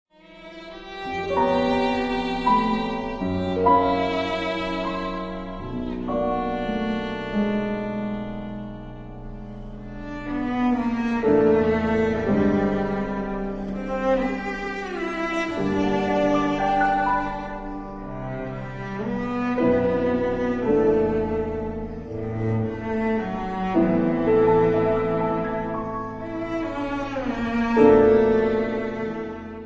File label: quartet for 2 violins, cello & piano Lento; Cowell; Scenario